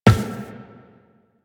Run Glass, Footsteps; Warframe; Tenno

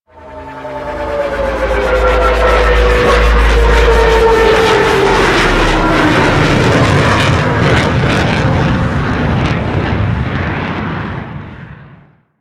Drop Ship Approach; Warframe; Corpus

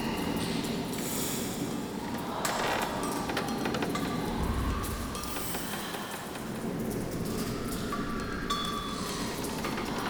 Smithy Bed Loop, ambience; Soul Plane

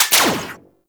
Rifle Mech; Grn Kuva Lich Rifle

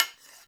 Hunter Bow Reload Arrow Knock; Weapons; Enemy; Bows

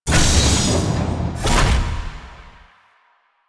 Vent Wall Close; ambience; Warframe; Entrati

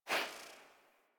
UI Theme Gear Wheel Transition; DE; Warframe; Zephyr

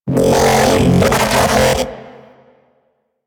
Mech Ambient Vocal; Warframe; Vehicles; NechroMech

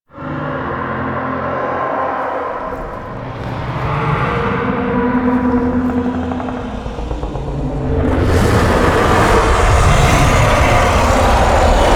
Dragon Establishing Shot; Duviri Quest

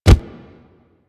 Truffle Jugger Step Default; Warframe; Infested; Juggernaut; Truffle Hunt

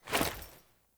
Foley Action Melee Heavy Swipe, Default Clothing

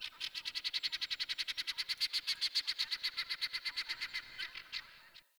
Forest Open Glade Bird Far, Day; Ambience

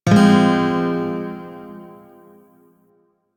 Shawzin Phrygian Chord G; Music; Warframe

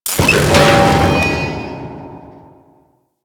Elevator Stutter D; Warframe; Corpus Ice Planet

